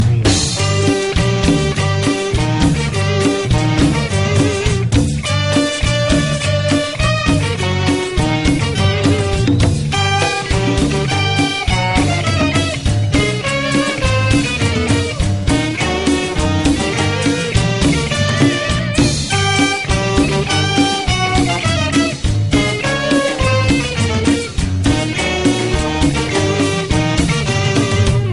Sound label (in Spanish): cumbia; imperial